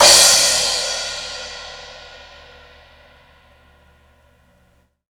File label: Chopped Zildj, Cymbal; KJ Sawka; Drum n Bass & Beyond; Drum Tool Box